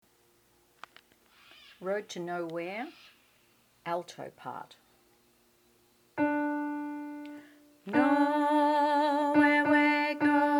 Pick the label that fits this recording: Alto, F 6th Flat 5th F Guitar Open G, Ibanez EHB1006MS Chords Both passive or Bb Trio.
Alto